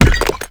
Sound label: Stone Pillar Debris Hit; Ambience; Warframe; Duviri; Murmur